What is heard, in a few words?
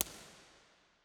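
close, impulse response, St Georges Episcopal church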